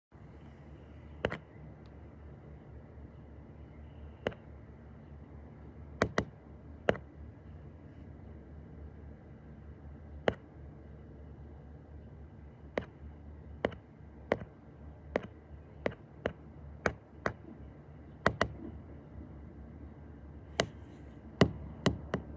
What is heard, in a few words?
touch phone